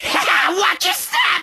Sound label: Rocket Minefield